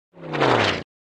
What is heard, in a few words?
Very Short Cannon Bal, ball whiz